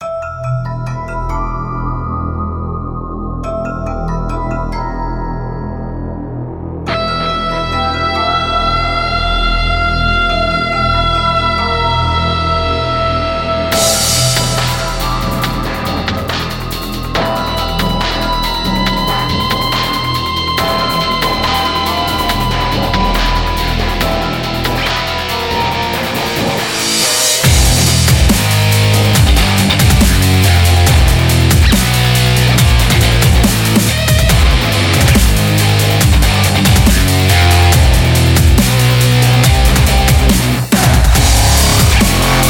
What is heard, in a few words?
nuclear dubstep; shreddage 2